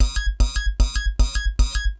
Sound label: Mech Stamina Empty Loop; Nechro Mech; Vehicles